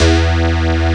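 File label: Metal Bass; awe32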